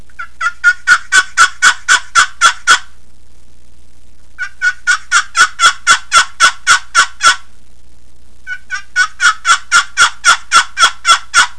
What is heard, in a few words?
darlin yelps, turkey; dixie